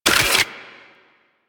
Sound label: Mech End; DE; Warframe; Weapons; Archwing; Arch Anti Aircraft Gun; Kuva Grattler